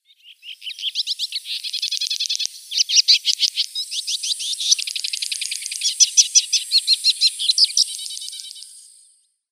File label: Ethiopian Swallow song